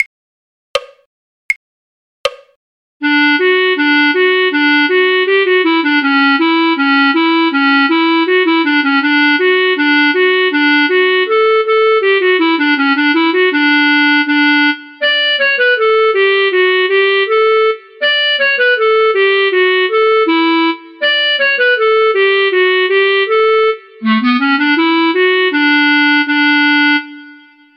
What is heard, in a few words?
clarinet; the galway piper; folk